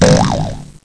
spring up; Ferazel's Wand Demo

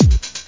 breakbeat; World of Sound; instruments; beats

